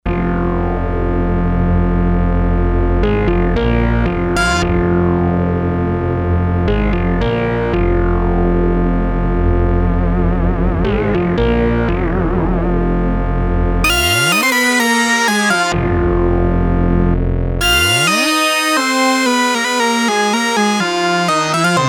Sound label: Stack example, Sequential Circuits Six-Trak; Stacks